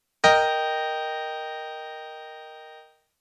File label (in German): Dreiklang; theorie